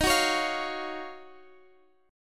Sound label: D sharp Minor 9th D sharp, down, Guitar, Standard; D#m9 strummed